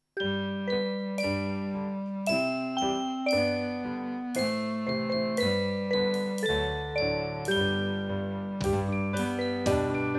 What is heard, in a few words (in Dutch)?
Nieuwjaarsbrief instrumentaal